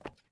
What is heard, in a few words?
fs wood, footsteps